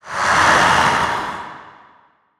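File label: Zombie Aggressive Reworked, monster sounds; The Decline Of Warriors; Monster Sounds Volume II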